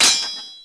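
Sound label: sword clang; AoM-ARTofMyth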